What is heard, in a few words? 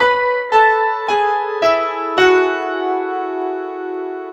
Piano (octaves); Sonic Foundry (Sony Creative Software); Ugly Remnants VOL-2